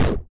Retro Impact Punch Hurt

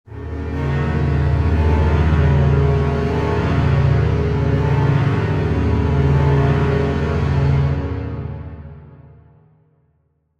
Chase Strings Low, Horror Strings

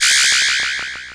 turnoff, Retro Sounds 32-Bit

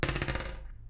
dice

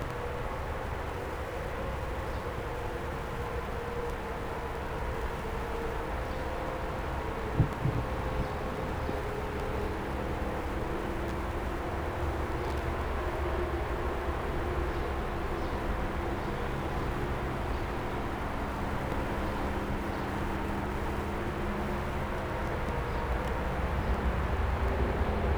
Outside, Fans and Drones

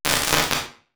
Retro Electric Sparks

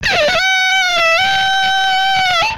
Skid, retro arcade; MMRetroArcadeSoundsPack1 0 5; Vehicles

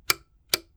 Model D - Switch, keyboard

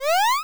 show up, square waveform; Square Waveform sfx

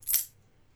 coin pickup

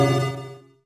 Retro Event UI Stereo UP

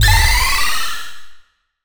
Retro Charge

